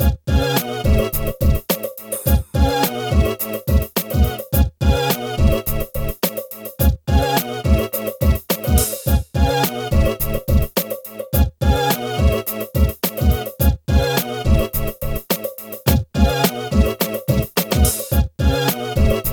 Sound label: BEAT, Beats 105-150bpm